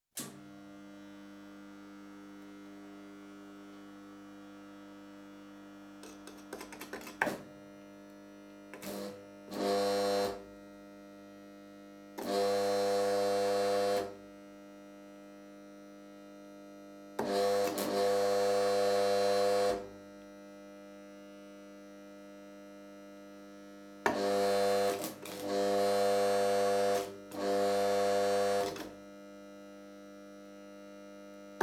Arc Welding Machine, Switch On; Construction; BBC Sound Effects Library

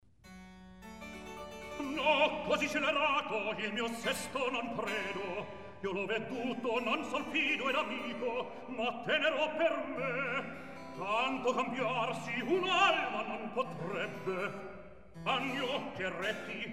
Recitativo; No Cosi Scellerato; Mozart; La Clemenza di Tito

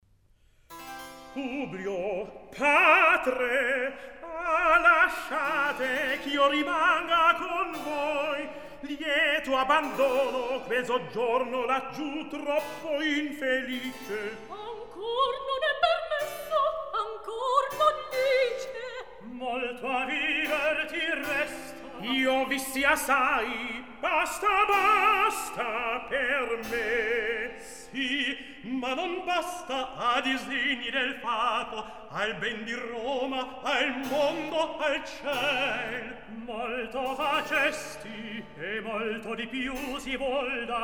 Recitativo; Publio padre ah lasciate; Mozart; Il Sogno di Scipione

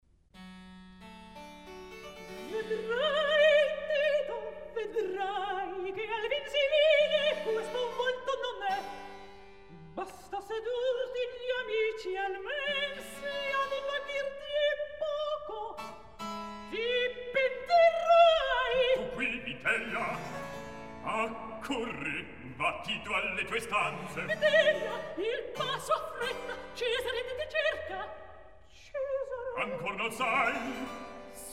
Recitativo; Vedrai Tito Vedrai; Mozart; La Clemenza di Tito

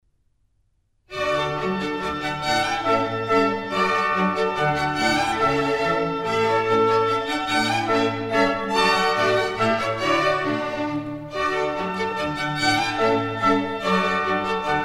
Minuets, D Major; Mozart; Dances and Marches

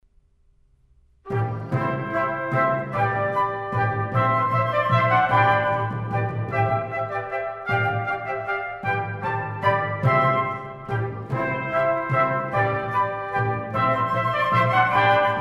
Divertimento in C Menuetto, Wind; Mozart; Serenades and Divertimenti for Wind

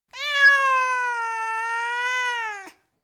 Tom Cat, Individual Meows; Cats; BBC Sound Effects Library

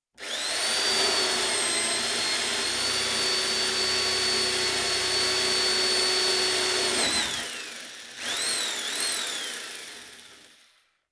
Electric Drill, Single Hole In Wo; Construction; BBC Sound Effects Library

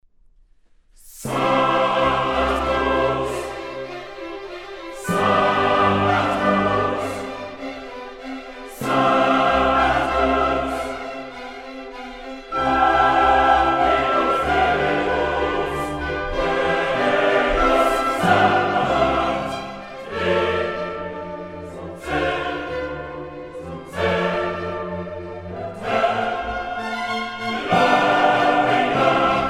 Missa in C Sanctus; Mozart; Missae and Requiem; Davis, Gardiner

